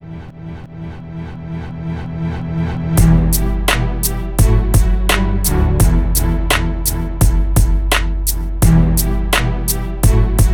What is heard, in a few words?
corporate score